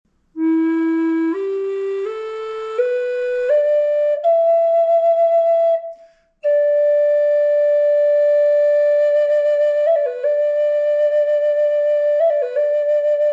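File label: reverb, flute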